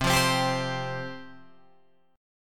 C Suspended 4th C, Guitar Open G

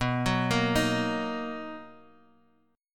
B Major 7th, Guitar Open G chord

